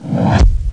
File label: thump; thexder